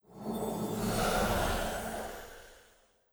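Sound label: pillar gate death; monster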